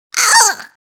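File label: electrocution vo; foley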